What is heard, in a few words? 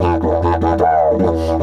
E 150, DIDGE RIFFS